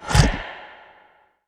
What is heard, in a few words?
boss umbra sphere join